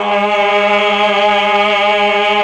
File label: CHOR, Propeller Island Legendary M400; ILIO